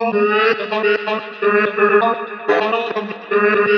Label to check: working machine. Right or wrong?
Wrong